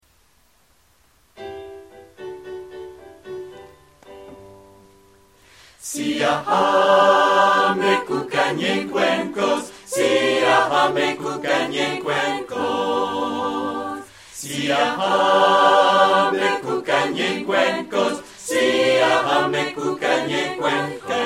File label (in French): siyahamba tutti voix